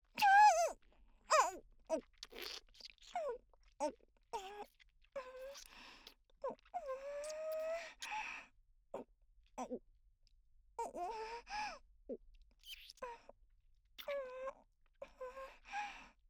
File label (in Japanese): フェラ・精液を飲んでいる声; ストレートお姉さん系カノジョ